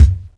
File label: Kick Vinyl; One Shots; Drums